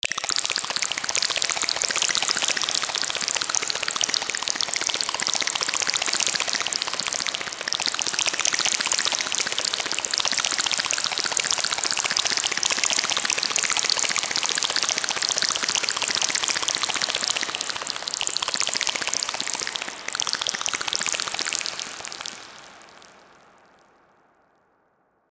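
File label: dripping noise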